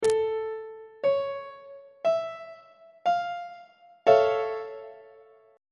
f augmented major 7th 1st inversion on treble clef